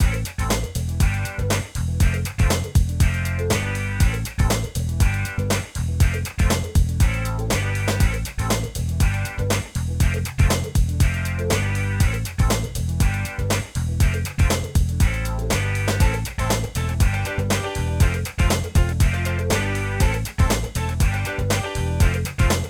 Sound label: encounter guitarist, music; Manamon Music; Manamon 1